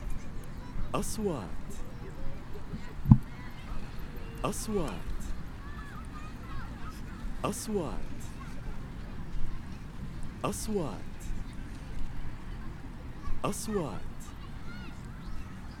Beach Natural Sound

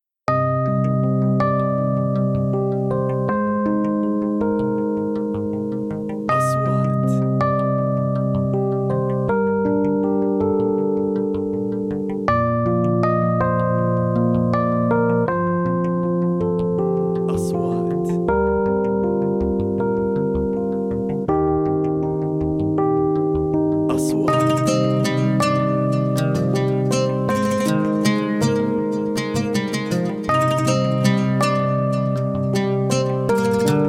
romansi

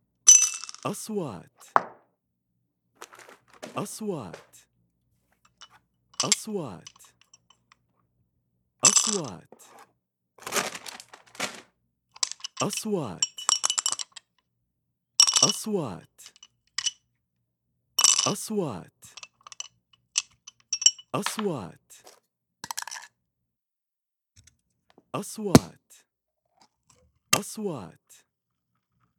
ice break cool drinks; kitchen

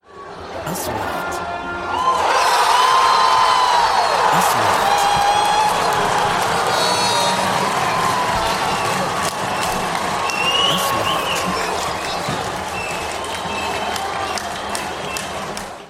Applause for football gool; football goal

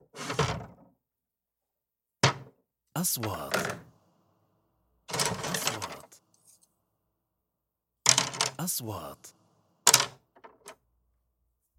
Wood

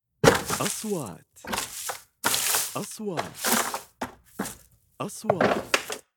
plumbing iron metals tools noise

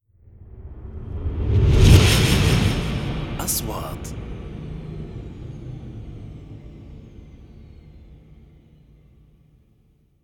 3D SFX Triler; مؤثرات صوتية

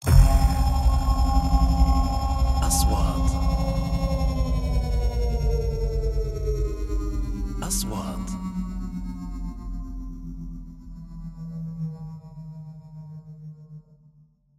chain

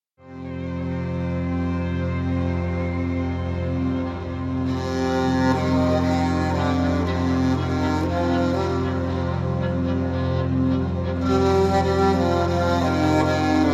documentary

